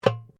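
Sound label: Drum Darabuka Strike piano, Egyptian Darabuka Drum; Commanly available Egyptian Darabuka Drum; Musical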